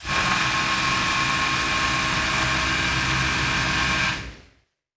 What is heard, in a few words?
servo big, sci-fi sounds, mechanical